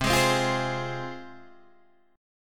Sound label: C Suspended 4th Sharp 5th C Guitar Standard; strummed; 8,11,10,10,9,8; JGuitar